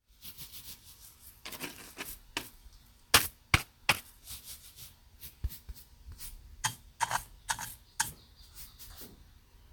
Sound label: Cleaning Brushing Sound